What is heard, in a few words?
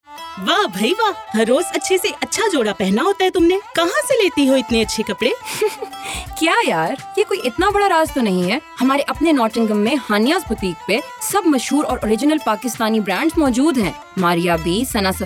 Jingle; Haniyas Boutique